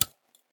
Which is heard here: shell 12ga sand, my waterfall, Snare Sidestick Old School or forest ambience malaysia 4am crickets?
shell 12ga sand